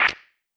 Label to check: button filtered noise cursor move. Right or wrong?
Right